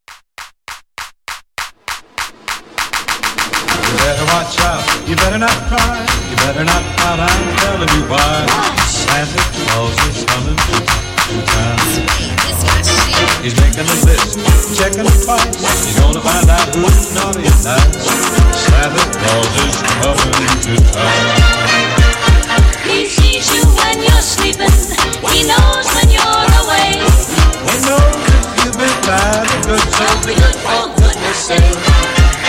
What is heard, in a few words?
X Mas Flip Mashup Trap